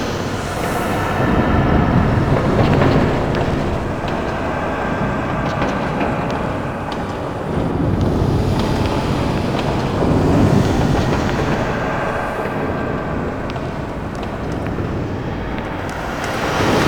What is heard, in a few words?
Fortress Interior Amb